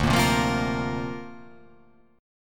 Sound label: D Minor 7th Sharp 5th, Dm7#5 chord; strummed